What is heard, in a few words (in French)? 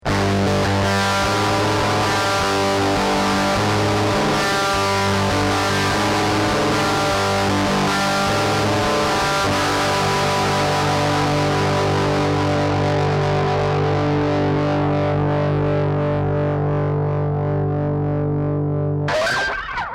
disto arpege; arpège son disto sans reverb